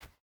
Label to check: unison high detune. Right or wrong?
Wrong